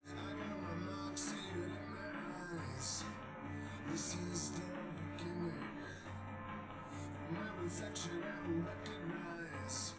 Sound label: drums live rec